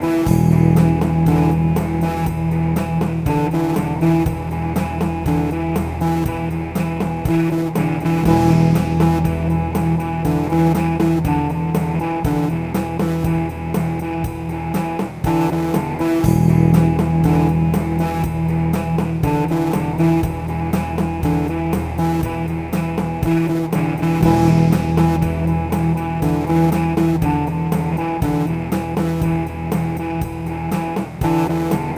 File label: Bass Loop